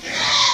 sheep lamb; animals; mammals; stamps

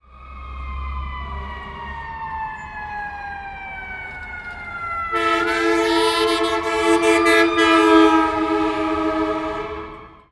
firetruck; vehicles; emergency; stamps

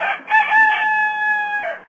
rooster, birds; stamps; animals

